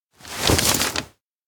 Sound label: bag drop map; anemoi